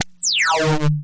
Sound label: laser, lasers